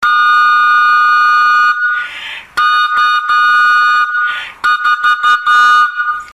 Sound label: 2 tone